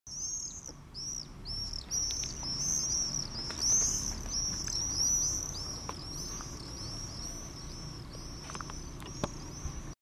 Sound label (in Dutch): Gierzwaluw Apus apus Geluid; Annelies van Klinken